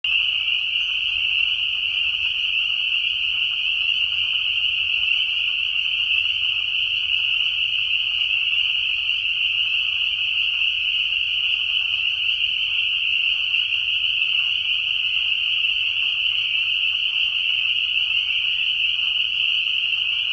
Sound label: Frogs near Vienna along WOD